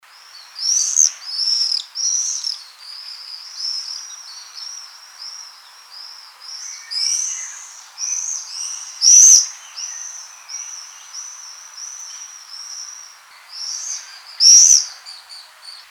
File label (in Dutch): Gierzwaluw